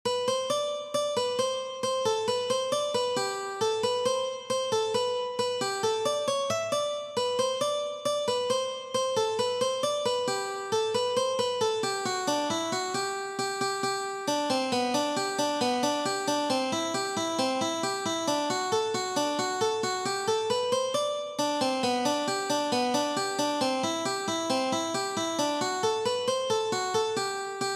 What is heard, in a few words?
Hornpipe, dulcimer